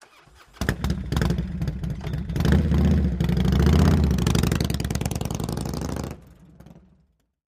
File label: harley away, harley-davidson